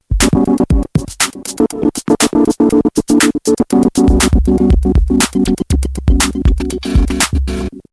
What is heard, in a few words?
Loops - Techno; Scratch